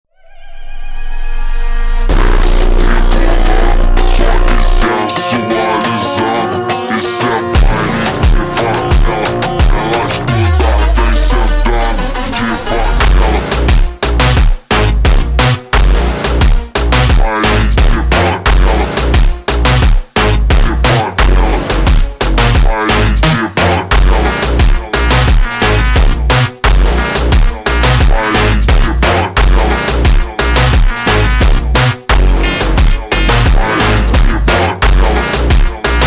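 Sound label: ultra Slowed, phonk music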